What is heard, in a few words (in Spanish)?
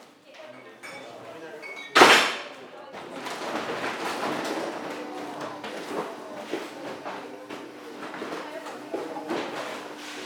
AMB INT MEDIODIA PLANTA RECICLAJE PLASTICOS Reciclaje plasticos y vidrio; P de los Toros UY; maquinas